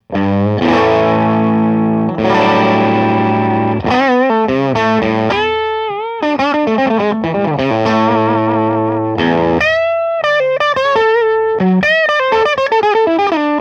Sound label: AC30 NORM GAIN